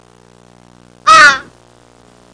CROW CAL; Internet Pratique 01

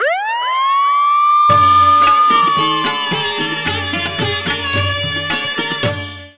Indian Anthem; worms a

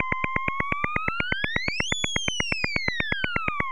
ambulance

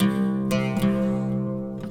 SITAR ETHNO 125; eJay; Guitar

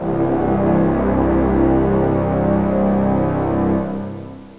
orch brass; OctaMED SoundStudio V1; mirage

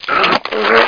gib; Quake; PainKeep; player

